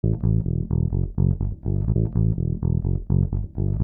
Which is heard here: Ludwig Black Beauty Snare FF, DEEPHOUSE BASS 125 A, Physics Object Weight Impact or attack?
DEEPHOUSE BASS 125 A